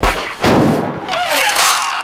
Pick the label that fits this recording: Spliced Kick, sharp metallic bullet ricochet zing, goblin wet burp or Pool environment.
sharp metallic bullet ricochet zing